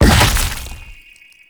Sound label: Laser Discs Proj Shot; Infested; Warframe; Infested Lich; DJ Rom